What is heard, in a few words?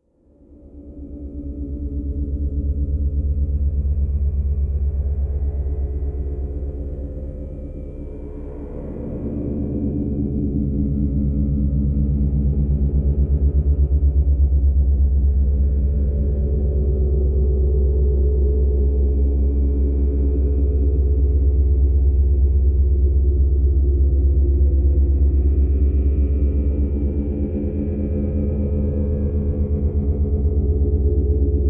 River Ridge Soundscape